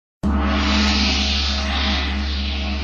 3d High Msg Tone

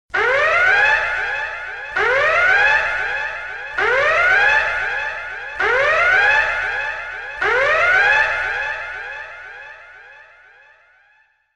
Red Alert

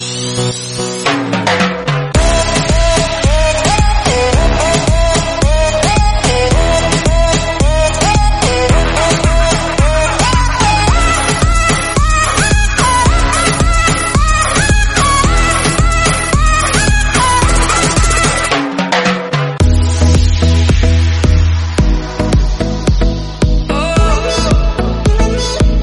instrumental

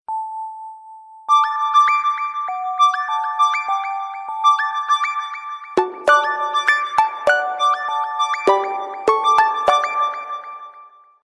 iphone 5 official

